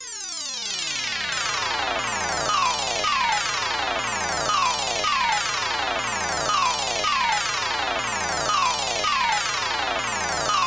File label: Electro; Temple Dojo B